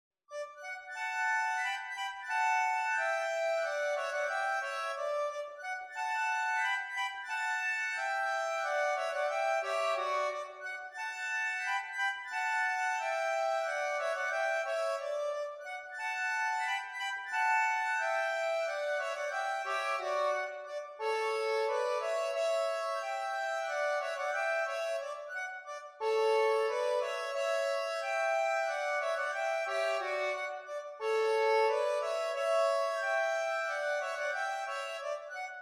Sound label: valse